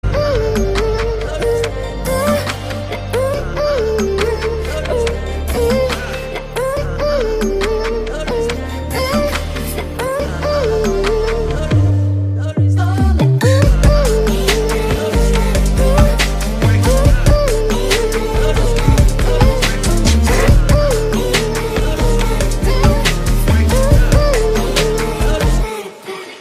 Bgm, Telugu; Varun Doctor

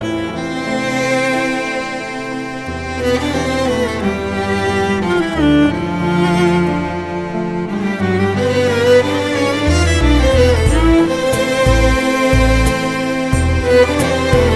Instrumental

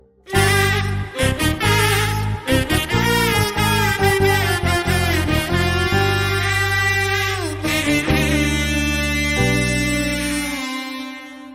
Kannada Love Bgm

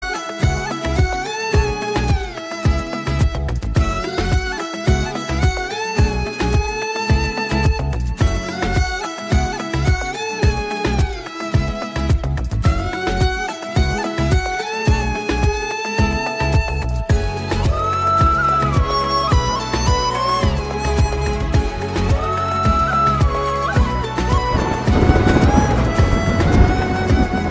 Song Instrumental Bgm; Telugu

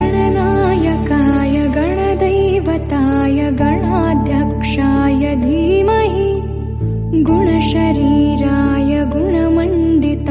Female Version Ringtone Bhakti; Telugu

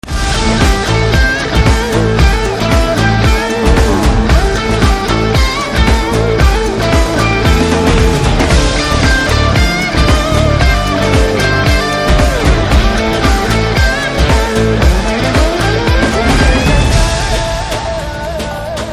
Instrumental Tone; Naa Peru Surya, Naa Illu India; Telugu

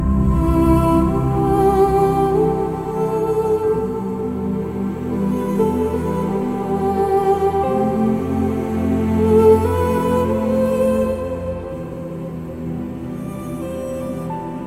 Sad BGM; Adam Joan; Malayalam

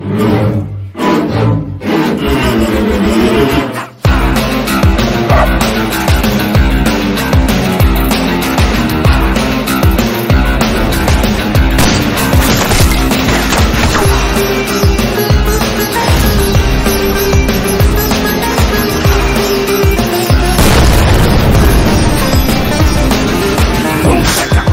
Kannada Trailer Bgm

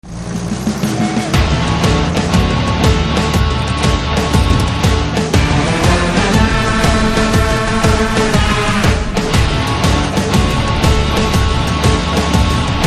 Lorry Test Run BGM Ringtone; Kaithi; Tamil

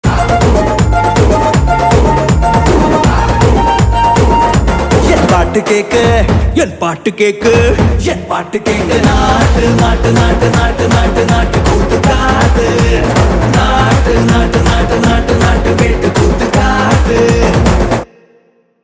Bgm Ringtone; RRR; Telugu

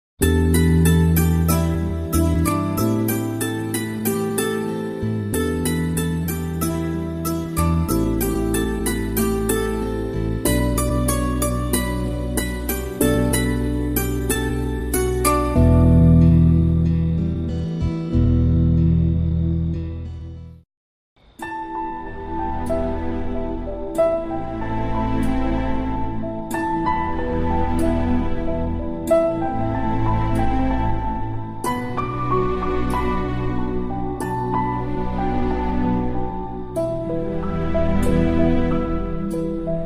Love Bgm, Tamil; 7 Aum Arivu

